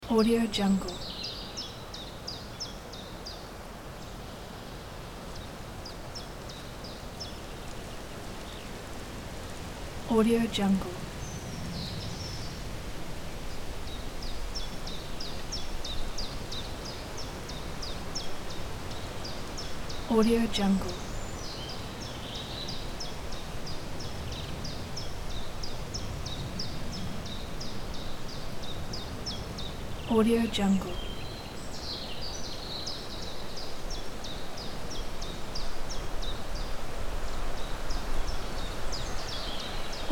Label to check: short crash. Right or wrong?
Wrong